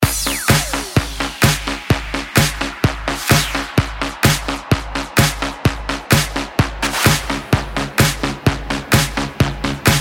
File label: Remix